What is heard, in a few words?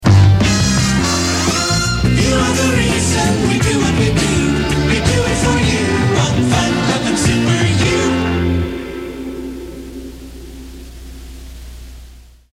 Jingle; WYOO; FL Super U